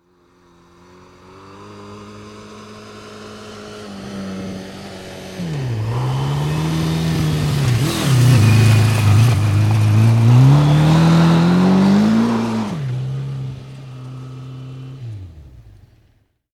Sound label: Motorbike Suzuki 750 Up and Slow Past; Motorcycle; Tom Hutchings